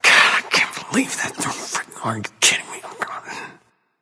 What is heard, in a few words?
grumble; smite; thor